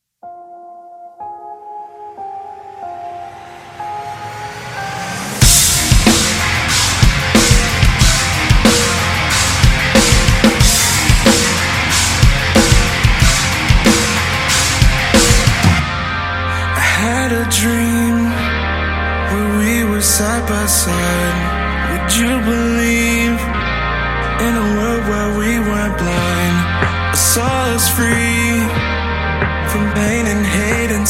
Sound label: DRUM COVER